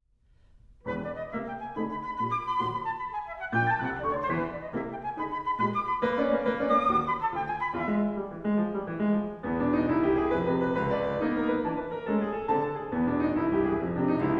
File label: sonata for flute and piano